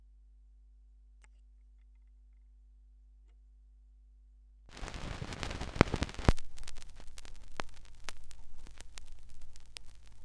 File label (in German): schallplattengeraeusche